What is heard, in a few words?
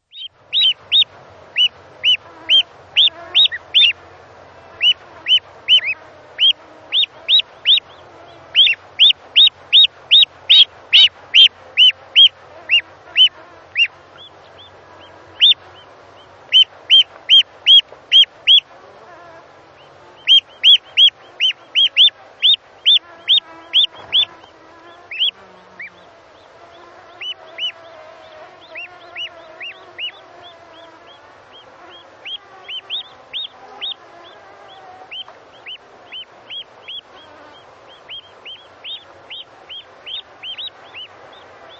anser indicus; gąsięta gęsi tybetańskiej; ptaki